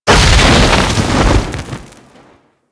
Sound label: Flame Burst Short; Explosions; Warframe